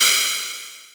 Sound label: Crash; Neeks; Drillers